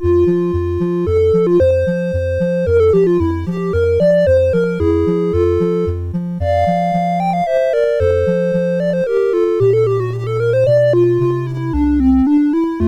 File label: Sonatina in F Major, CSG 8580; CSG8580R5